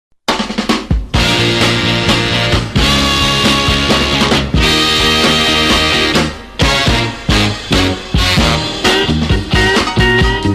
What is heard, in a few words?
rythm blues playlist